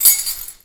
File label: Pickup Key; Pickups